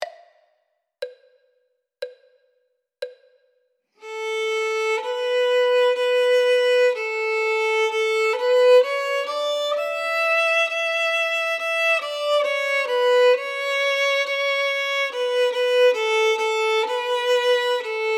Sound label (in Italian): violino I